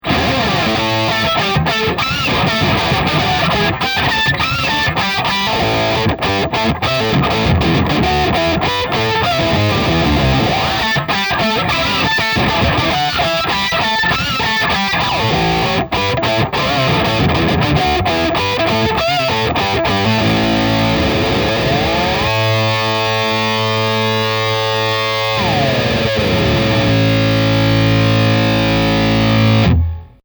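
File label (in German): Oktave Up Oktave Down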